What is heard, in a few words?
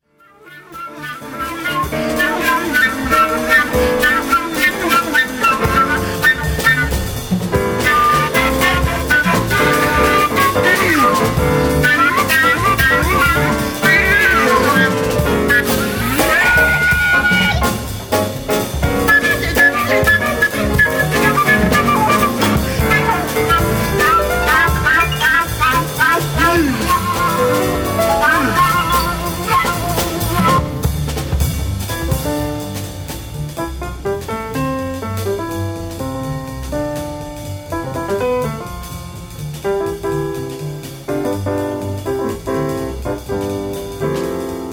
Flute